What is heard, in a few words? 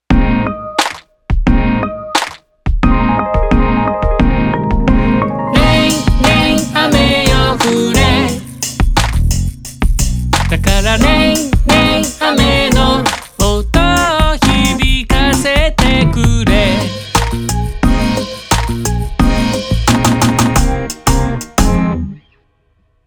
Rain